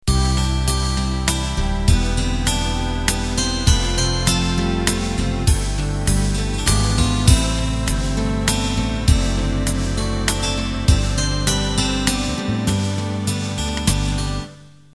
Waltz Intro

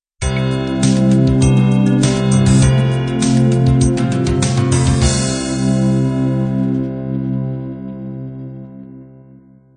pop ballad 3 end

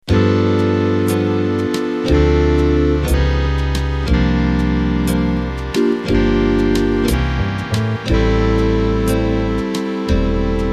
Slow Jazz

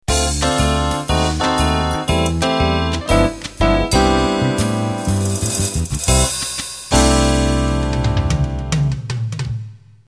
Ballroom Swing End

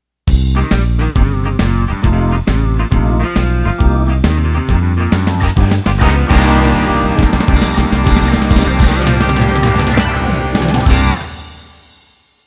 Blues Shuffle End

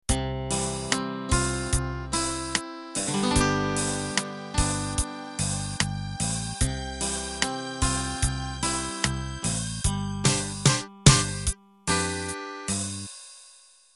8Bt Standard Intro

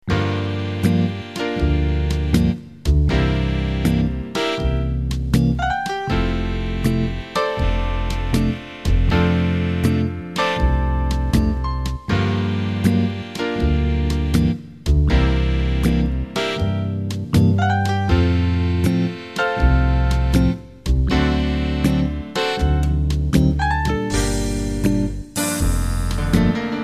Big Band Ballad

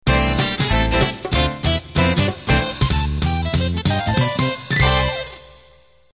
Ballroom Swing End

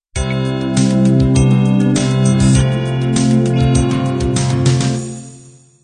pop ballad intro

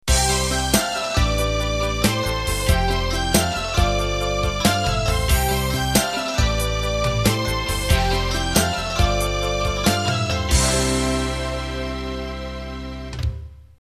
Slow Rock End

